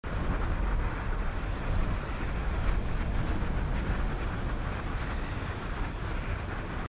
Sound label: Jump Wind